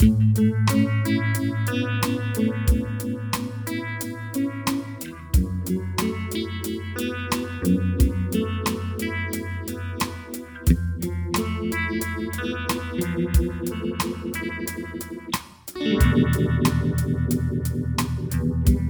chorus clean; rotovibe

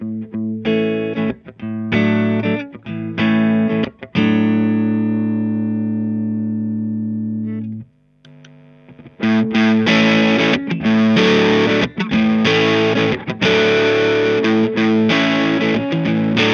strat orange clean; coron distortion10

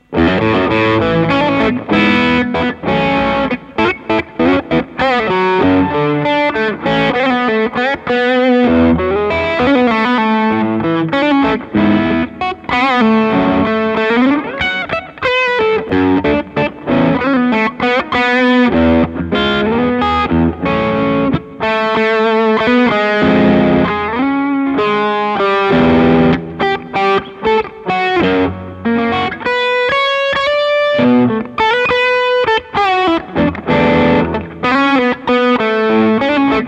sparkledrive taivas

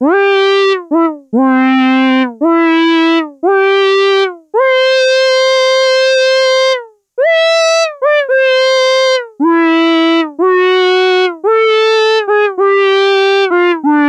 kivalead, nord modular g2

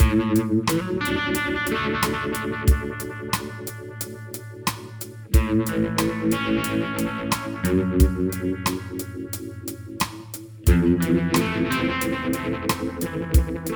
chorus dirty; rotovibe

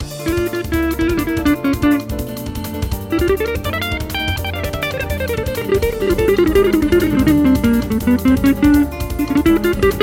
330bpm solo

